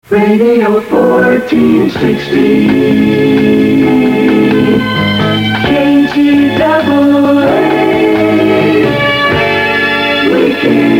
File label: Jingles; KGAA 1460 Kirkland 1979